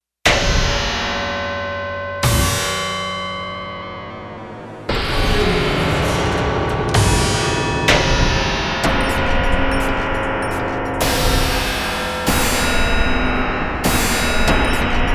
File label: FX Menu